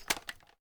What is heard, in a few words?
inspect; gmod; viper